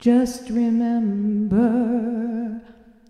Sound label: just remember SUNG